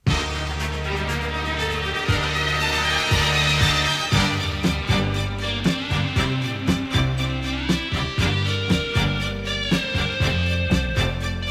topformat maxi jingle single

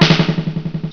BANG

